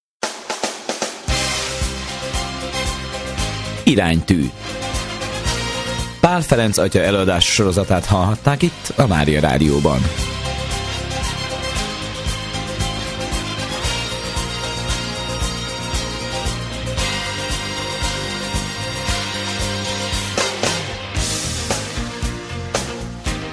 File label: Jingle Befejezo; Iranytu